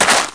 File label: dirt, player; blackops